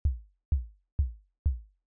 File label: kick